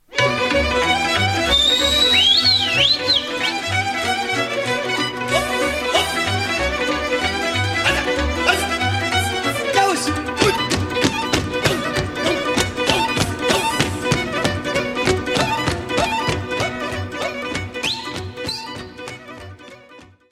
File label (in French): Sirba - Roumanie